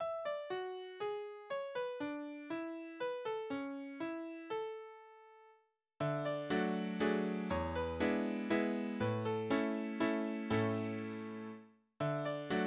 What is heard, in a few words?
score, midi